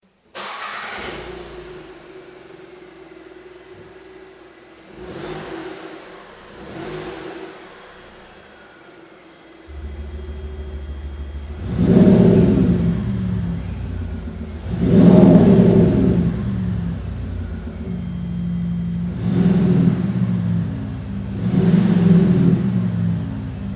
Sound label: Sound Booster VW Polo extended; KUFATEC